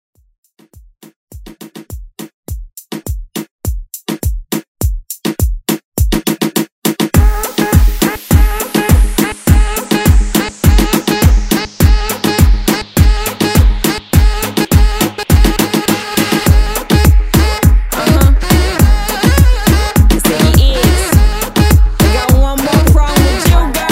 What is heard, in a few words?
Reggaeton; Redrum